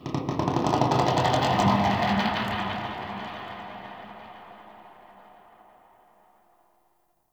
Exterior Space Rings Details; Ambience; Warframe; Railjack

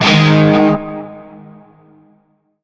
Shawzin Chromatic Chord F; Music; Warframe; Narmer

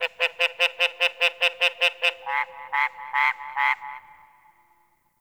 Night Tree Critters; Warframe; Eidolon Plains; Ambience

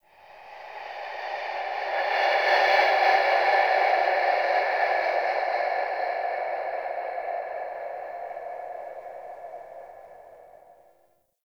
Haunted Breath, Ambience; Warframe; Shadowgrapher

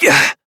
Pain, Adult Operator; New War

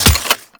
Ghoul Crutch Step Dirt, Footsteps; Warframe; Grineer Ghouls; Ghoul Drill Sergeant; Foley

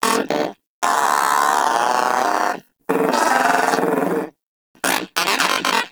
Moa Aggressive Vocal Bored Mock Sleep; Warframe; Characters; Pets

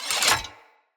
Sword And Board Un Equip; Weapons; Player; Swords; Sphere

